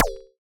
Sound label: Alien FM Perc Short